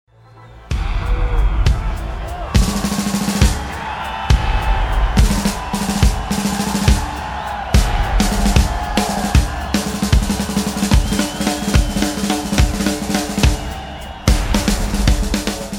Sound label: thunder